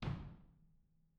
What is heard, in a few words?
R B Kick Room, drums, One Shots; Sonic Foundry; Sony Creative Software; Drums from the Big Room The Mixes; R B Kicks